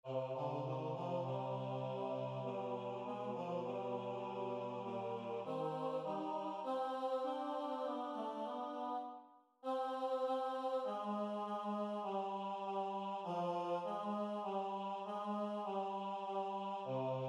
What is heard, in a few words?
Tutti